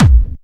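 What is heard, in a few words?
BD Clubby, bass drum; VEC1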